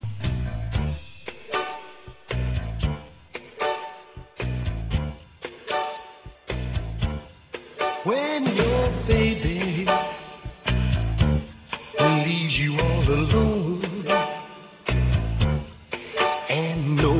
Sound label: cry